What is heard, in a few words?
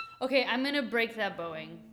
Violin